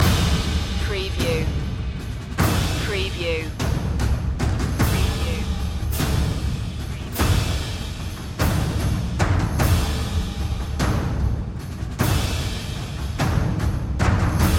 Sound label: percussion loop